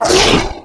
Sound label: hit, bloodsucker; sven; russian sounds